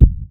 Kicks Soft

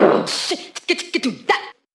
boom shik dikki do dah, beatbox; Drum loops

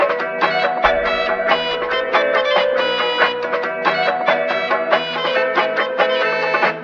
horns, phonky loops

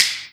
Snares, Clap n Snap

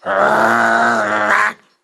growl male; ooh ahh

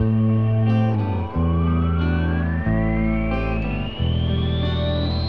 guitar, Jazzmatazz Loops

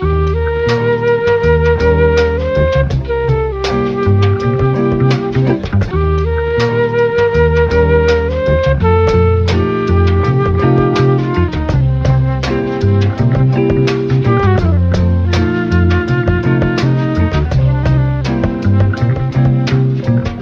flute, Loops Phonky

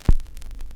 crackles, Vinyl

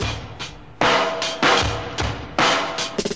last girl on earth, Drum loops Boombap

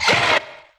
"huh", voice

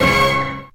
beathavin, Stabs

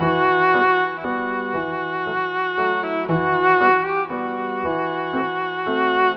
strings hard rock feel, Sad Loops

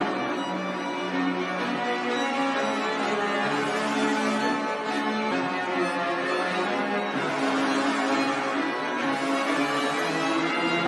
strings regrets, Sad Loops

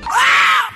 scream; ooh ahh

